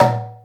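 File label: bata double, African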